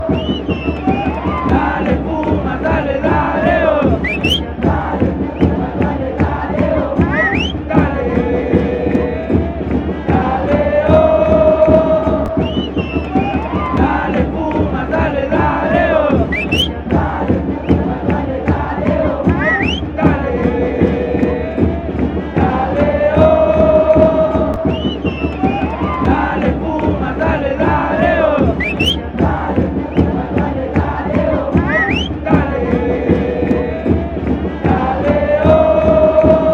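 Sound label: fanchants, football chants; dale pumas dale oh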